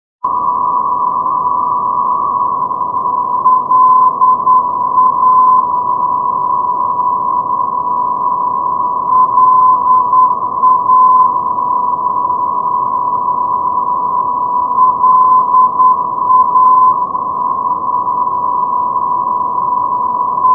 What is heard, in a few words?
ndb langholt